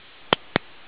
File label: click